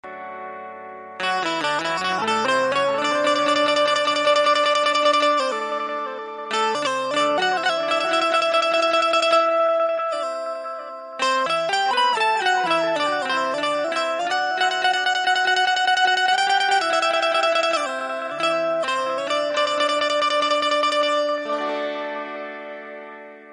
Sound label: banjo music ringtone